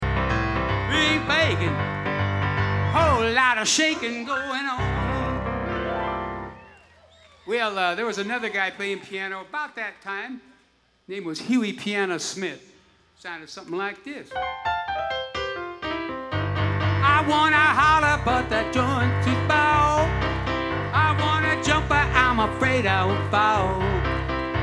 Piano Moment

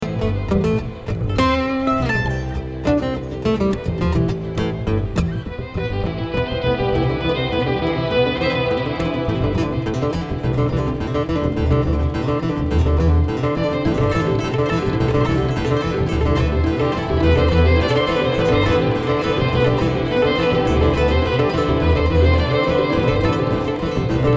Traffic Jam

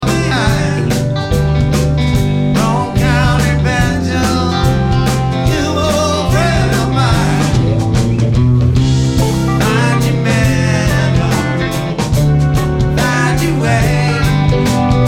Banjo

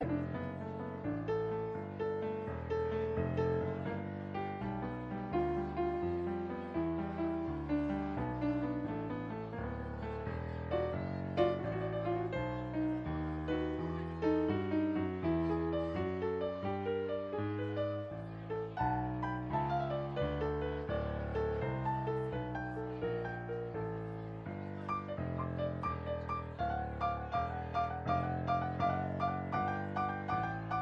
tuning intro

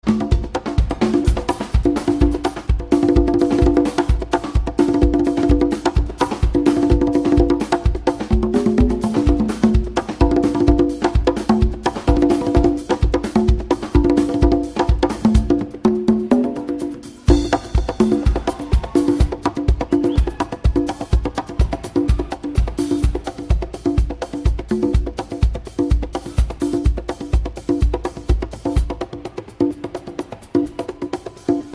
Percussion Jam

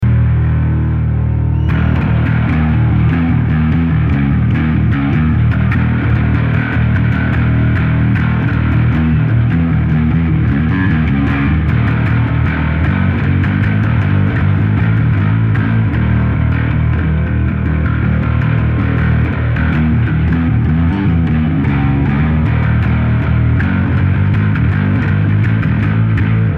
Bass Solo

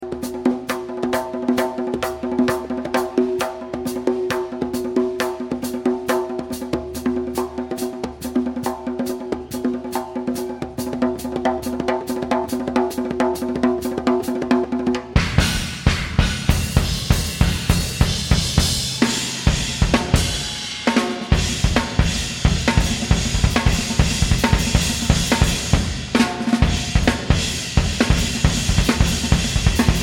Drums

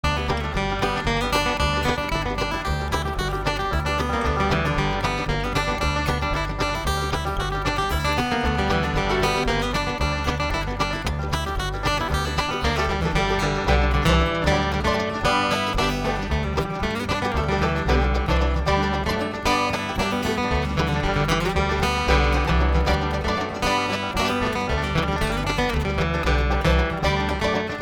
New Instrumental

